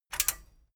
metal button stereo click enable fast

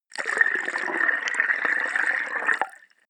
bubble squish

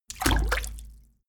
walk foot in

